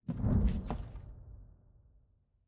Turret Enter

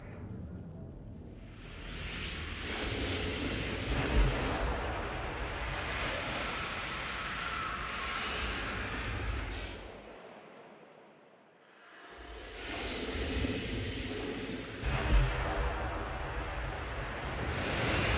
Main Entrance Door

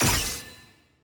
attack heavy; bloom minigame

